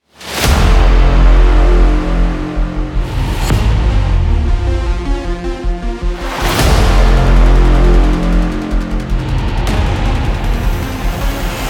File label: action cinematic movie trailer